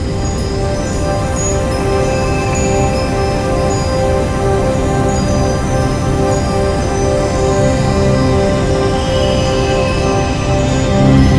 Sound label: Xmas drone loop